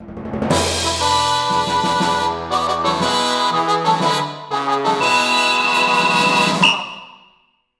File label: Hero Jingle